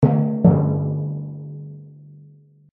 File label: Timpani Drum